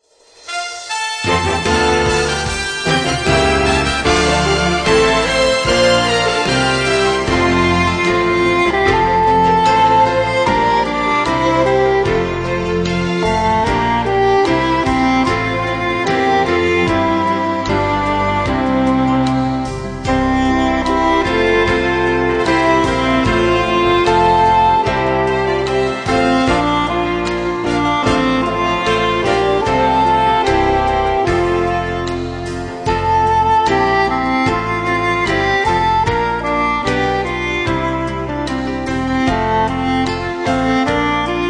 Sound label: Instrumental Hymns